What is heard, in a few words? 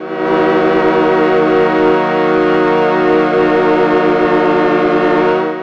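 85 Ebm Glide Synth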